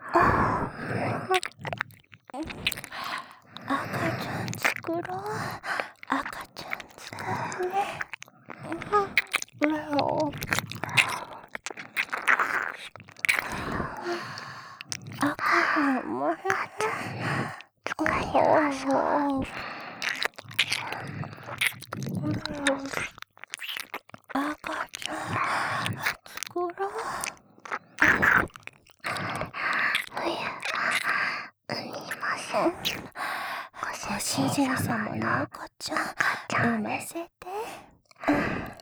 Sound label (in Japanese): 耳舐め; 赤ちゃん作ろ♪